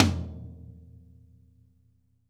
TOM RSHOT; 90s sample CD

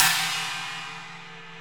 China Cymbal; Multisamples; Digital Sound Factory Planet Earth

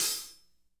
hat slosh

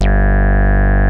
HITEC G1, ANALOG BASS; Music Store - Claudius Diemeter Schwerlos

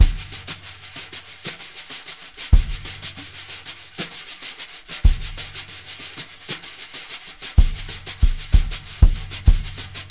BRUSH LOOPS, 95 BRUSHIN

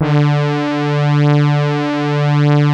JX STAB D3, HARD BRASS; Music Store - Claudius Diemeter Schwerlos

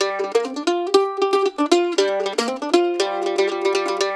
LICK 120, Blues Guitar; ILIO; Fretworks

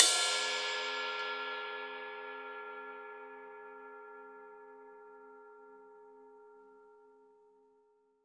RAW RIDE, Drums Acoustic; ALL RIDE; USB Soundscan vol.10; AKAI; 90s sample CD

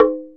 Tongue Hi; Multisamples; Digital Sound Factory Planet Earth

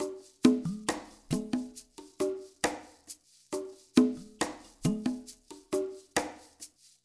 PERC; Spectrasonics; Liquid Grooves

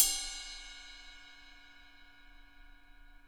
ride

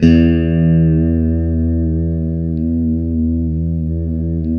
E 2 JC120, Guitars, JC-Strat

